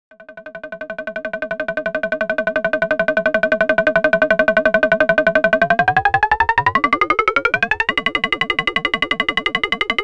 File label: Mini Korg 700S FX